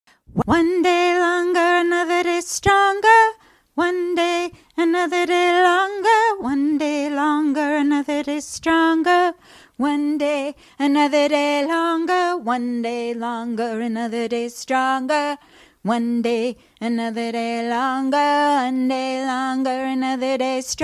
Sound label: just bass alto